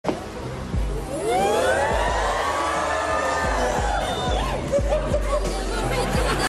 studio audience woo sexy

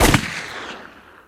gun shot long hit

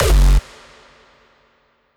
aggressive hardstyle kick long fall, 160bpm

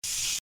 ssshhhh

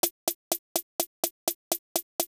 drumbit

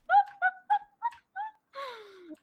laugh

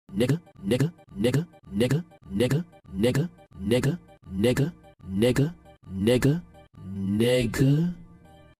hahaha

